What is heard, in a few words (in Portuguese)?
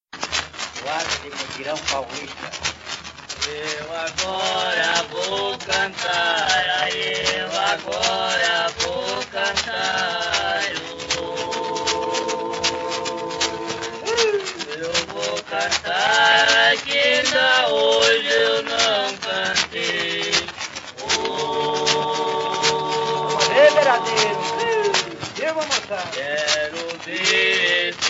Toada de mutirao